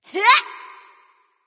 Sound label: grunt attack; smite; neith